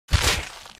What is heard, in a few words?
headshot; rust